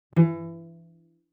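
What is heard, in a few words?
Cello pizz fortissimo sul D E3; MIS Pitches - 2014; Strings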